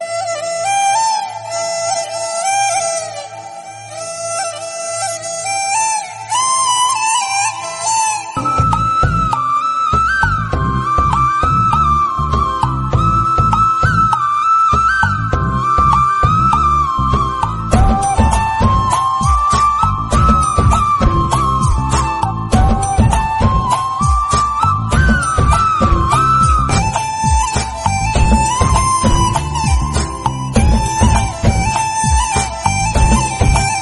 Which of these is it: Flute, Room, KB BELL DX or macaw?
Flute